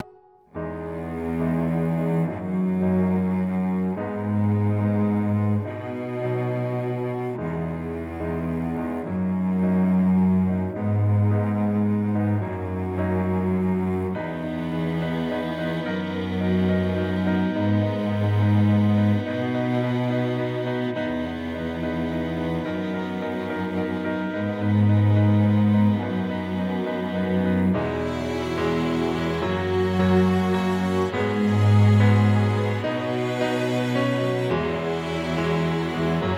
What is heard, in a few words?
(Isolated Orchestra)